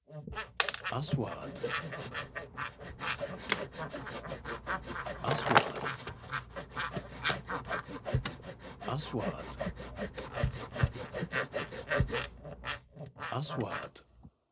منشارات; sound effect

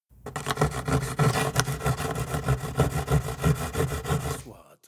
منشار; sound effect

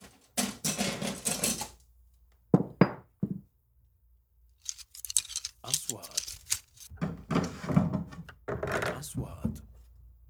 ادوات نجارة; sound effect

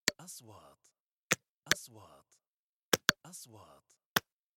نغمة كليك; transitions

